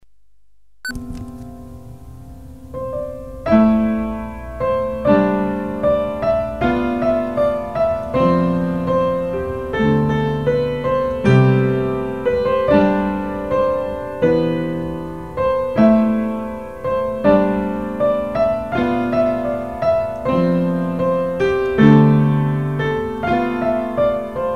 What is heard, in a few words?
piano